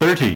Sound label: GPWS; Prometheus; barotrauma-localmods